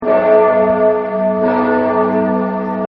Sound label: Big Bell; Bells; Musical; Big Bell Chime